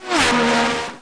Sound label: flash